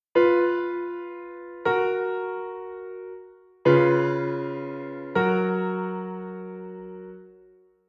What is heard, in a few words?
mi sharp si, fa sharp la sharp